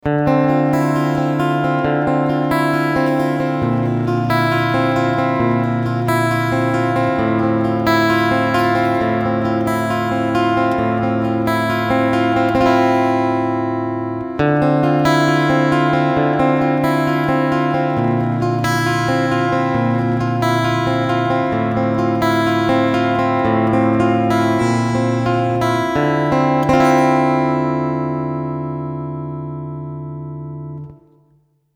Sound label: Room